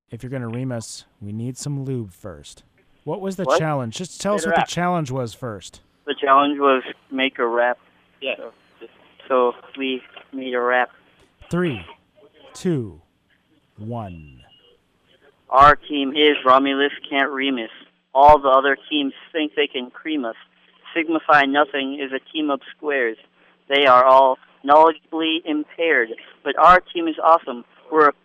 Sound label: RAP